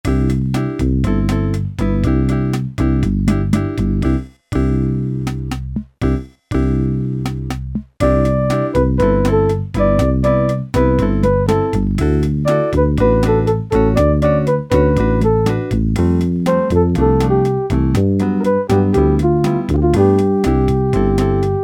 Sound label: BOSSA NOVA, Yamaha SHS-10 Factory style demos